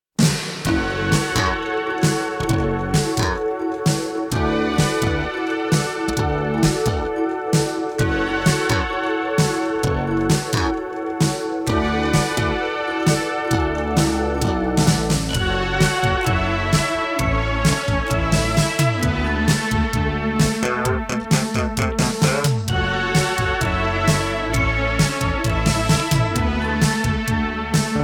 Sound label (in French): accords